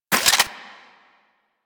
Anti Aircraft Gun Mech End B; Weapons; Warframe; Archwing

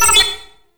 Flying Robot Beep; Quests; Veilbreaker; Ambience; Kahl Quest

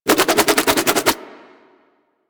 Nunchuck Quick Spin Whoosh; Warframe; Infested

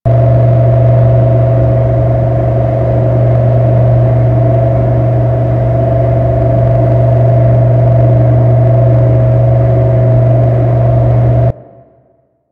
Generator Hum; Horde Room

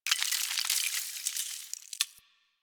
Landscape Ice Slide Small; One Offs; Warframe; Venus Open World

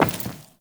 Walk Metal, Footsteps; Enemy; Lady Deora

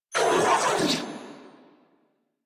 Death, Female; en; Warframe; New War; Narmer Grineer

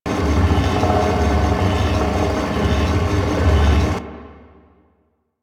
Floor Machine Loop; ambience; Warframe; Grineer Galleon

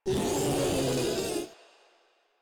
Infested Ancient Vocal Attack

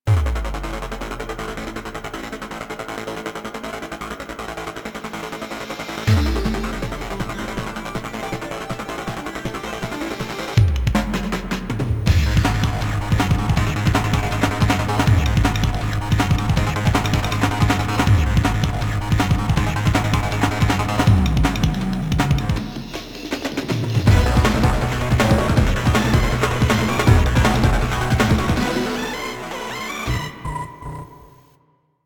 Music Intro; Warframe; minigames; Caliber Chicks minigame

